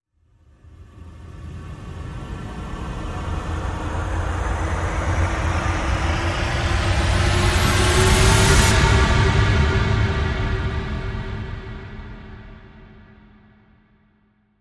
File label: Echoes Cyber Rise